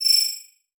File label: Beep Multiple; Multimedia Internet CD-Rom Flash